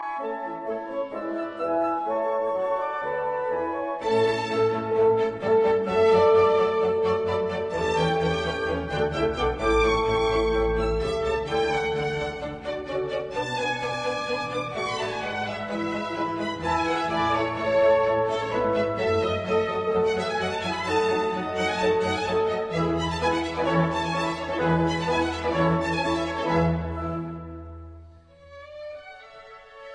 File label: Piano Concerto in A major, Allegro; Piano Concerto No 23